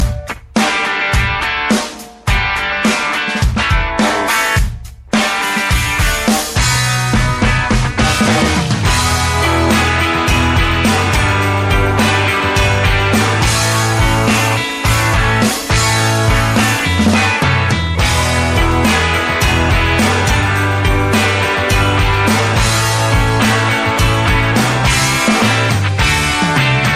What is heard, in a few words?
Rock Highlight